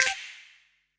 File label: Notifications and Buttons